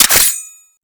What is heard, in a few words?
Blade Open; Warframe; Tenno; Soma Kama